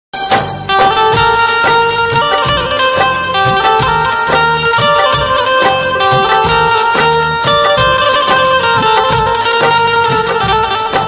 old is gold instrumental ringtone bgm ringtone hindi ringtone